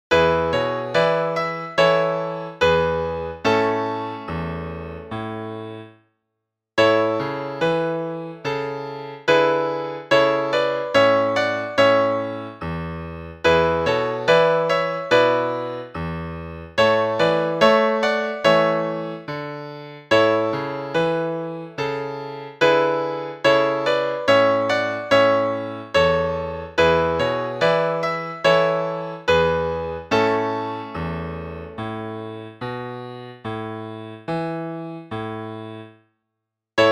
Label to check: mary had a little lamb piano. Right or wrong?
Right